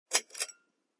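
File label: Chain Pull Light Switch; switches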